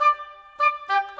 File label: Flute Oboe, Orchestral; Sonic Foundry (Sony Creative Software); Orchestral VOL-2